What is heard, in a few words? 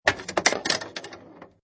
Pinball Sound Ball Released And Rattles Objects; Video Game Sound Effects